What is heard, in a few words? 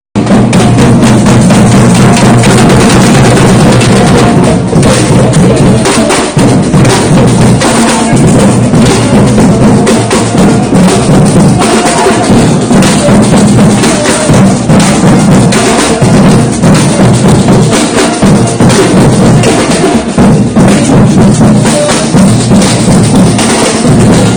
batucada